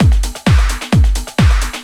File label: drum 130 dance, drumloops